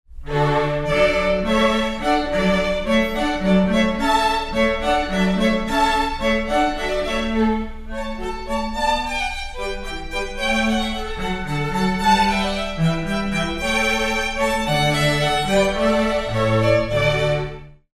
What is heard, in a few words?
handel hornpipe from the water music suite, strings